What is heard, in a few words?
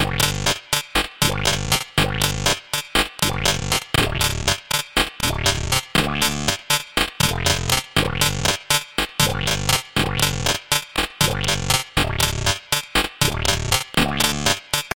Funny walk